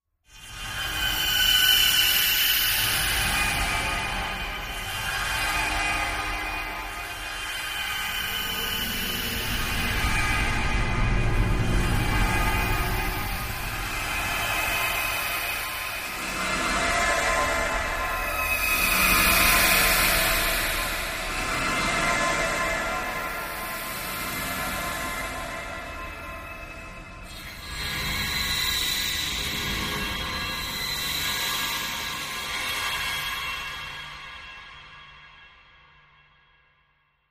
Subway Phantom Subway S, metallic

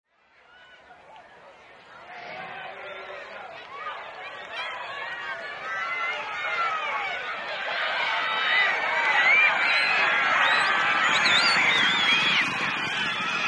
Horse Race Sequence

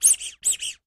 Rubber Toy Squeaks, toy squeak

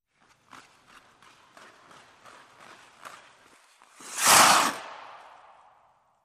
Hockey Single Skater, ice skating

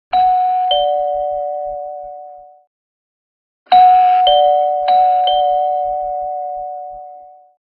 Doorbell; Household Doorbell

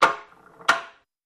Gears Clack, Mesh, Clack

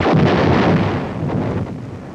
Cannon Classic Old; shots

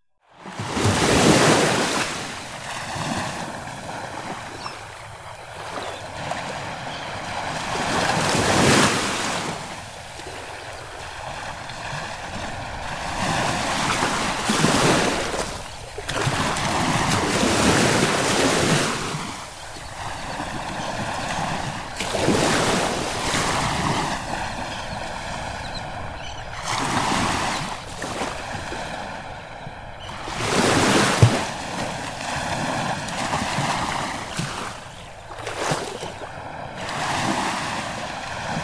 Ocean Ambience Close, waves & surf bg

